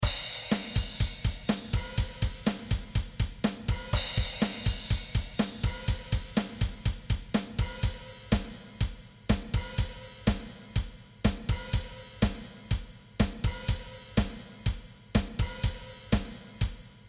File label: Drums